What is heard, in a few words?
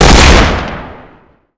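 double barrel, shotgun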